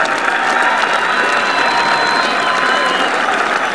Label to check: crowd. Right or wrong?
Right